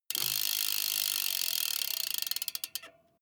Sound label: bmx bike freewheel push and slow down stop